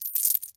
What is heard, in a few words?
Coins in Hand; Miscellaneous; 1001 Sound Effects